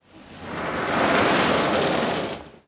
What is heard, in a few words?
dust storefront; Counter-Strike Global Offensive